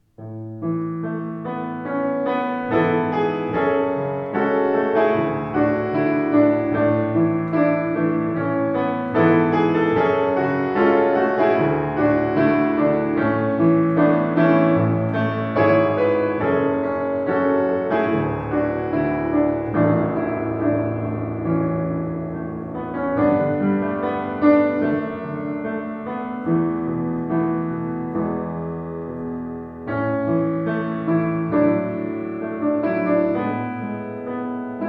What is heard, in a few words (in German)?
Schimmel Kirsche Wohin, Klavier; 117 schimmel kirschbaum